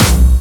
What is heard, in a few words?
Normal Bass